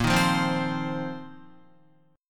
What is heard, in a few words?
Bb Suspended 2nd Suspended 4th, Guitar; Bbsus2sus4 strummed; JGuitar